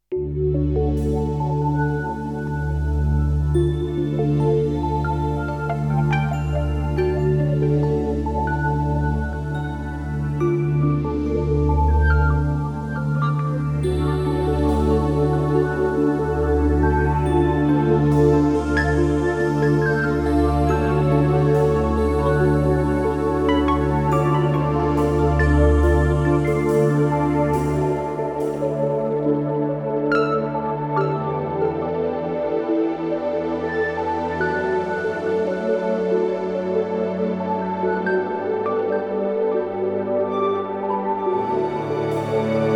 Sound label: emerald forest chill music for relaxation sleep and meditation